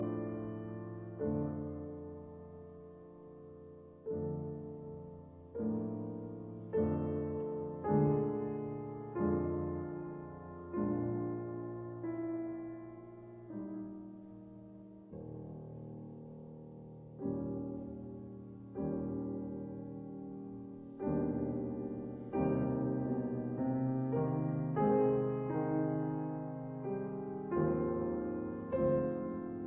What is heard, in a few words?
Piano Sonata in F minor, Andante